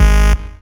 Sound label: Synth Shots; Vengeance Samples